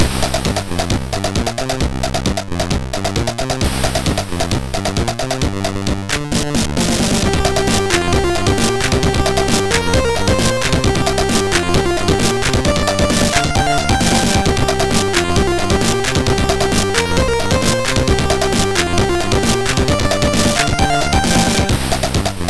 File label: MOS6581R4, sid